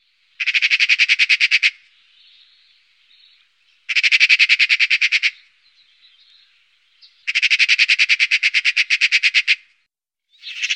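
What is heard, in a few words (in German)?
Elster, magpie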